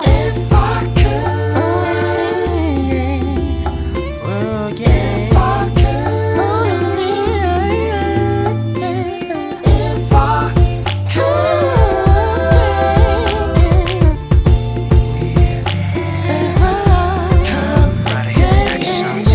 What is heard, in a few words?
sad movie